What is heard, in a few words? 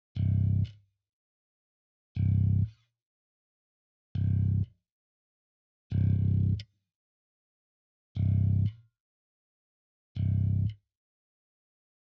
Bass